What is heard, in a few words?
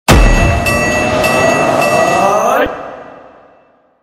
death slam cast; lash